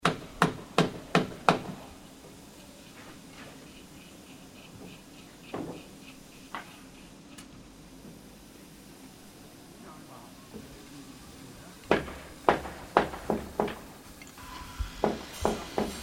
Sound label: Ambience Construction Site Sannohe House Builders, Builders working on a house; Builders working on a house in regional Japan; Construction Sound Effects